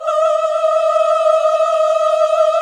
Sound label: GOSP. Oooh, Roland; Sound & Vision - Gigapack II CD 1 (Roland); HAL Gosp. Oooh 2